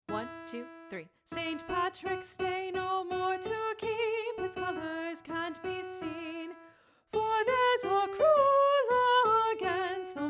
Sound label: Wearing of the Green - Soprano 1a